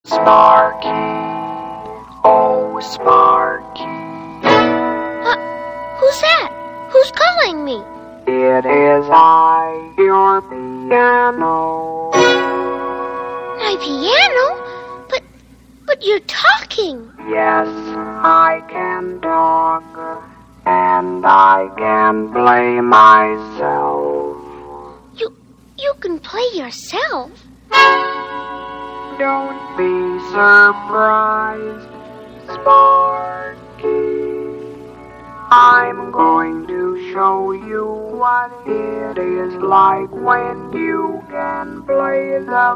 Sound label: Piano Sample; Sparky